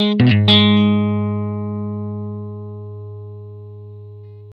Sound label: blues guitar riff 120, Vintage Blues Guitar; USB Soundscan vol.22; AKAI